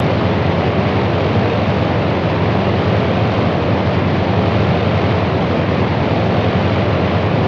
B29 Grp Stdy, b-29 superfortress; B-29 Group Steady Resample

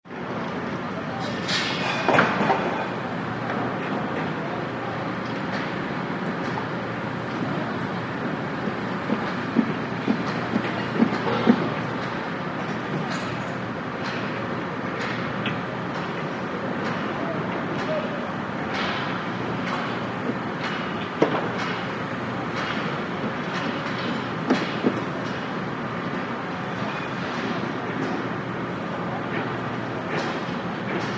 City Construction

